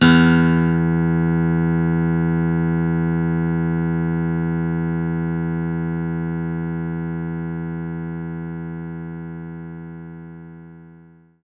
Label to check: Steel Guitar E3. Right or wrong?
Right